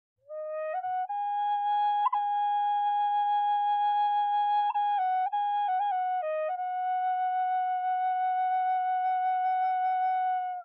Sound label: Drone High Eb, Native American Drone Flutes; Scales; Full Spectrum Scale